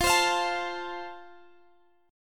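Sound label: A Minor Sharp 5th, chord strum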